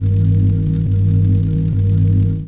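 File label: electric hum pulsing loop; electrical sparks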